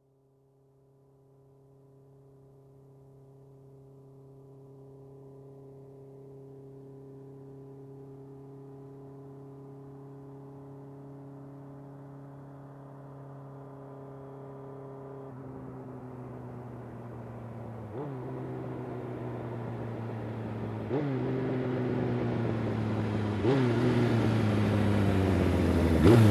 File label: Motorcycle approaching and parking